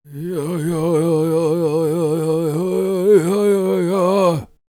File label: World Voices, CHOCTAW